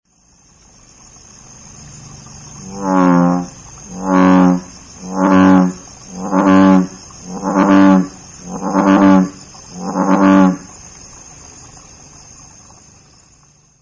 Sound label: ushigaeru call, frog